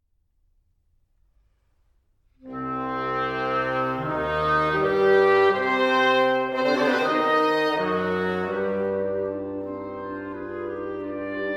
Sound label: Quintet C minor Allegro